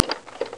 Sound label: projector; Filevision IV